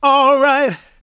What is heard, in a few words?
GOSP MALE, Vocal Planet Gospel